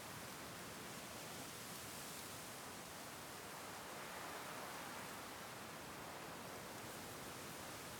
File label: wind night loop; background